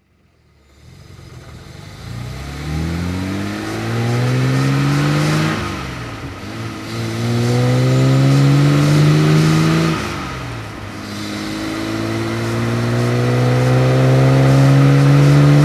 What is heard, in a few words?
healey 3000 mk1 driving